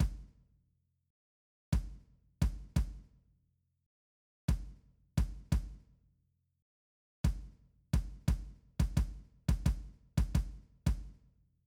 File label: Loops